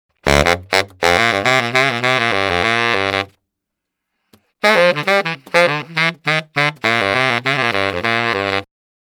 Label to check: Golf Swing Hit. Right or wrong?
Wrong